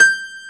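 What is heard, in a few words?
PIANO CLEAR G5; AKAI S6000 CD-ROM - Volume 1; PIANOS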